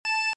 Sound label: Notes Music Box A Loop; video games; Ocarina of Time; OOT